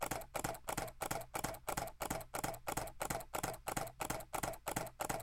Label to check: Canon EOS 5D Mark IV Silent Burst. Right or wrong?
Right